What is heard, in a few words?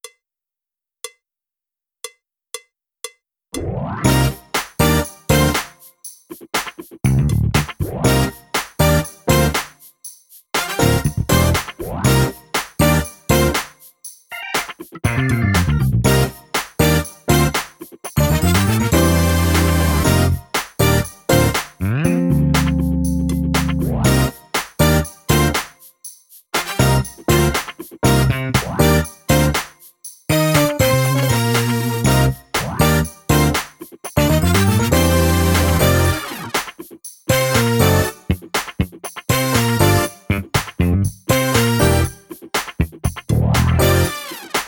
drumless